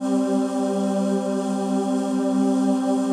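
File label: PAD, atmospheric pads; Best Service; XX Large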